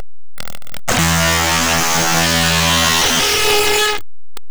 layer for E, bass

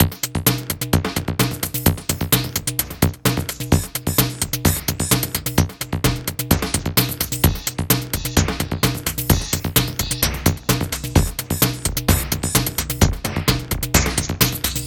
NEURAL NET BEAT; FRENETIC FRACTAL GROOVES

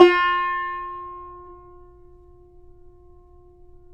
yayli tambur; Guitars; Spectrasonics; Hans Zimmer Guitars VOL-2

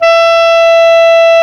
CLAR, Clar Jazz; clarinet; Roland L-CDX-03 Disk 1; Clarinets

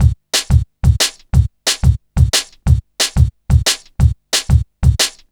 Kick Snare Loop; Construction Kit; Drum & Bass

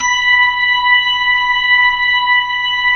B-3, Jazz

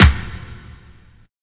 combi drums

